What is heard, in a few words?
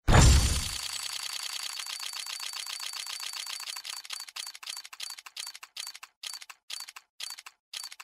open